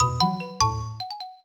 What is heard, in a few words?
Jingle Good